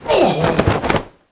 Crystal die

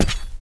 Foot

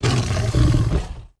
Torturer Idle

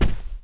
Foot; Ruga; Seer; GamigoNA

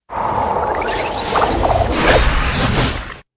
Crab Spine; Seer; GamigoNA

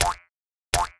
Monkey Walk

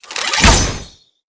Archer Attack; Seer; GamigoNA

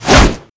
Snowy Wolf Attack; Seer; GamigoNA

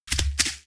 snowy wolf run; Seer; GamigoNA

